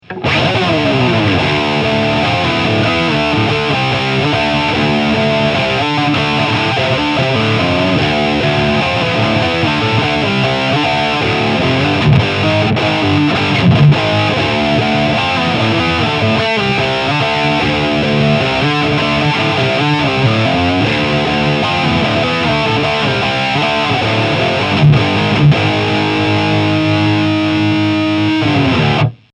Boost

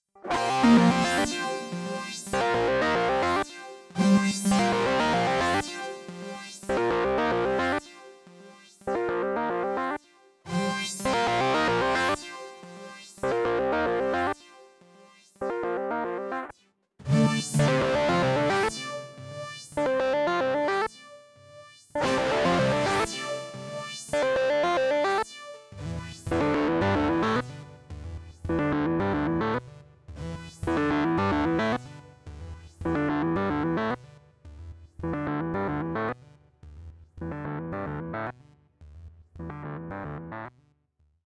Mono Root King

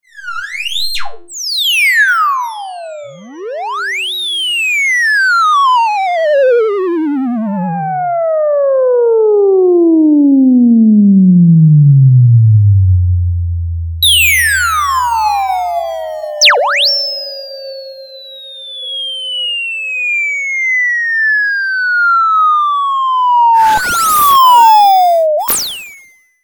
Rocket

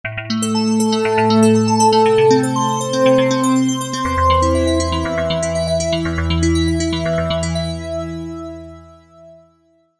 Bellstring

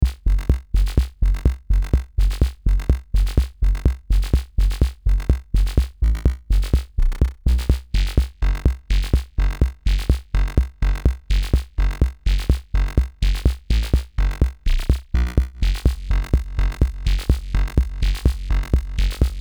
Mix Bus Lowend Shaping

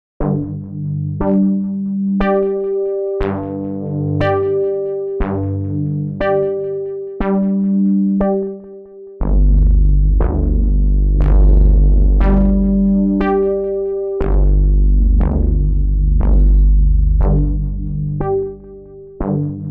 Pedal Delay Synth Analog Chorus

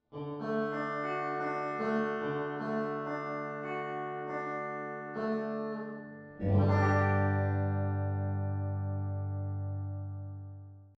Rev-X Room; Steinberg UR816C

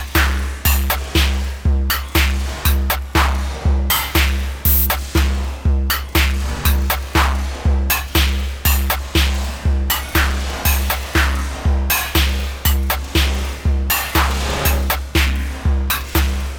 MOD Devices MOD DUO X Bad Acid beat

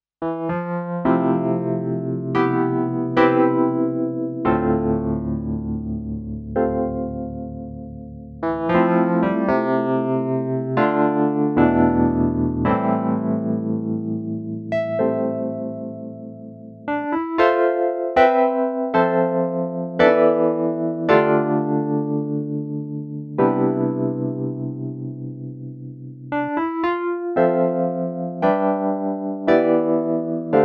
Grand Piano XXL E Piano

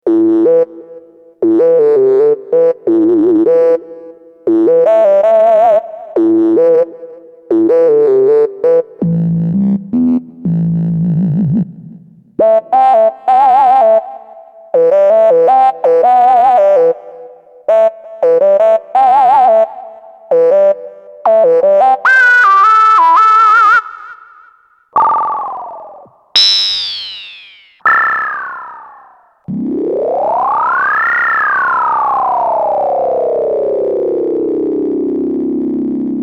Dirty Lead

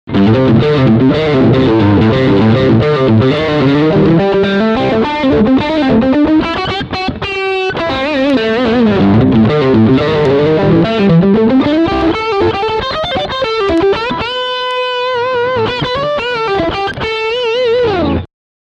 Middle Singlecoil Crunch

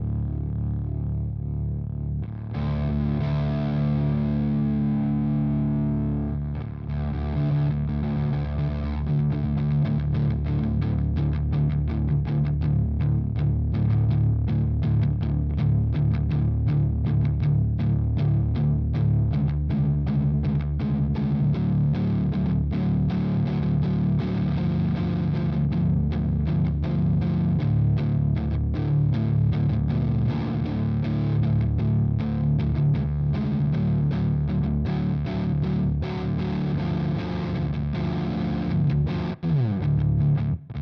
Ripped Speaker Fuzz dark tone; EHX

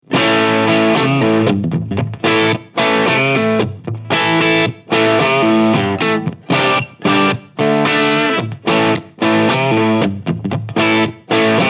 Marshall 1959 Tuned Crunch

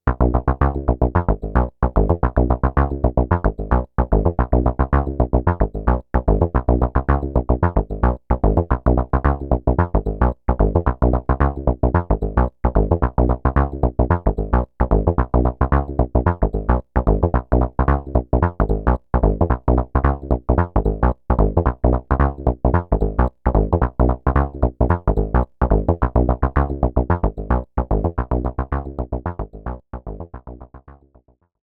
Shuffle; Urzwerg; DarkEnergy

